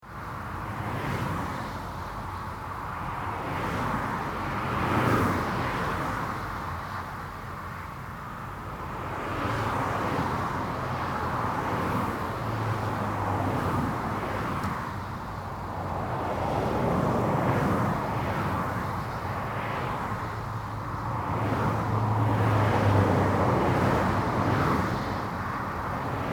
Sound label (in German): vorbeifahrende Autos